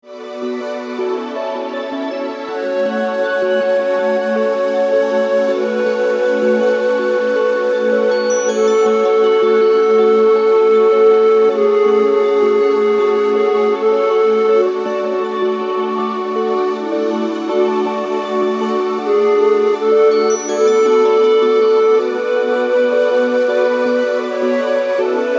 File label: Spa Music Relax